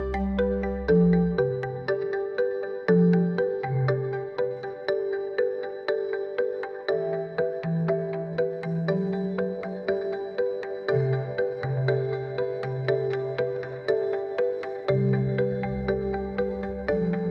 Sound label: alarm